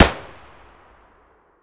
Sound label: akm muzzle distant, weapons; ak103; STALKER; IWP